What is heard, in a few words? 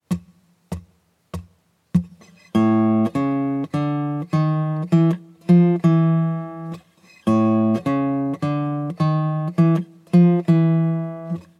Blues Guitar Turnaround